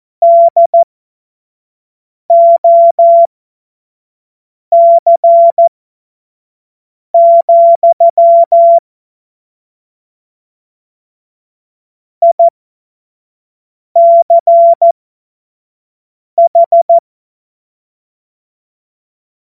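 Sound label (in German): Witz, Morsekurs, cw morsen; Witze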